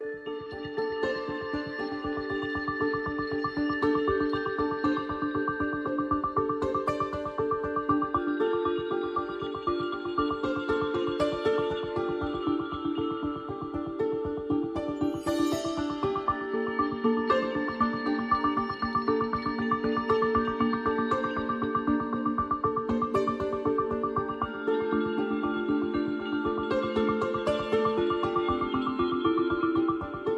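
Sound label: Percussion and Pulses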